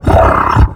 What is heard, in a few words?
biomech vce hurt